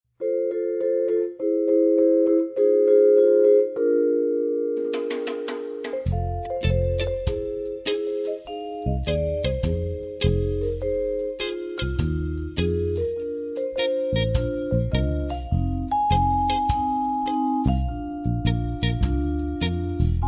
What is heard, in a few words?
Vibraphone